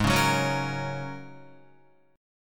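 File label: A flat 9th Suspended 4th A flat Guitar, chord; Ab9sus4; 3,4,6,6,6,6; strummed; 4F,4A,5C,5G,5C,6E; chordshape; JGuitar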